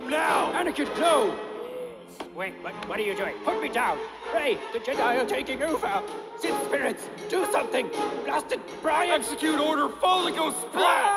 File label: Fall and go splat